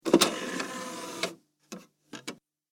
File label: Dvd Blu Ray Machine Tray Opens Disc Inserted; Entertainment System